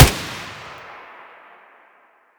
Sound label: aug muzzle mono distant, weapons; STALKER; IWP